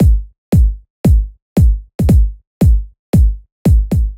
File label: bd house